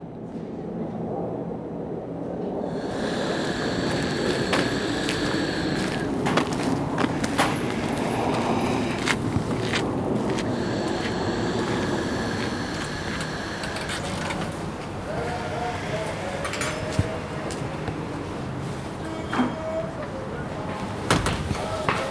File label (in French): Carte postale sonore